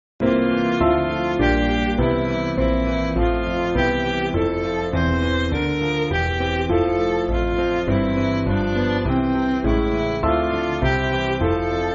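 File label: Midi, Backing Files for Churches; Sweet Is The Sunlight; Woolmer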